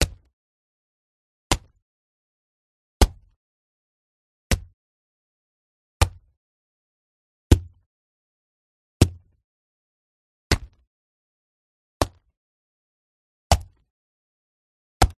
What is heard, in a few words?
impact spagetti squash hammer; audiotank